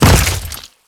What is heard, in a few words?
Step Goo, Footsteps; Enemies; Warframe; Grineer